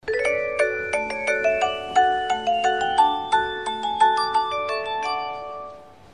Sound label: Train Melody; Osaka